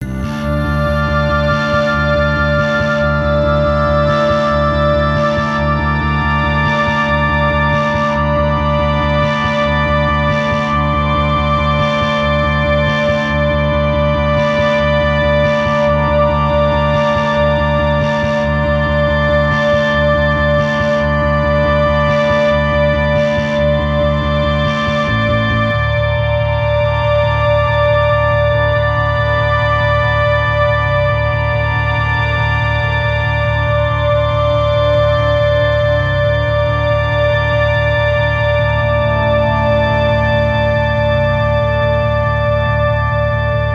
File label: Ghostly Spooky Building no drums or guitar; CUES for film TV games etc